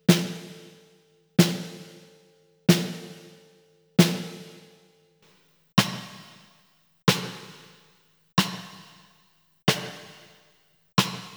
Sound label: Blue Amb